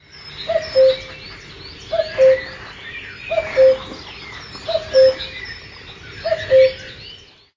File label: cuckoo